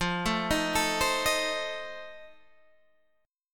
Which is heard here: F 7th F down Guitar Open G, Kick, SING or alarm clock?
F 7th F down Guitar Open G